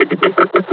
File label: laugh, combine charger; Half Life Alyx